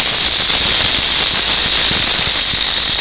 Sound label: Meccano Magic Motor Running